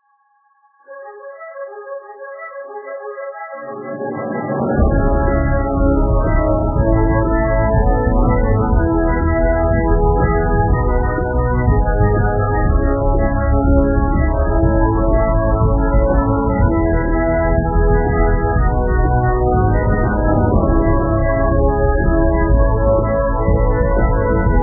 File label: super epic